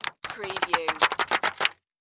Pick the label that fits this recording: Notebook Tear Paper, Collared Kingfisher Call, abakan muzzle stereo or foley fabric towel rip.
Notebook Tear Paper